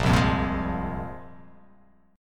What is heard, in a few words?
A sharp 7th Suspended 2nd, guitar chord